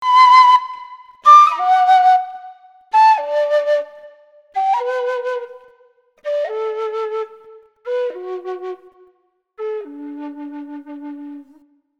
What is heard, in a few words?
whistle dmaj desc